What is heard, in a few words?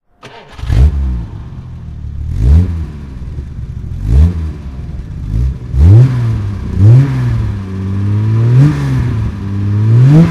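kougar jaguar 42L throttle, Engine sound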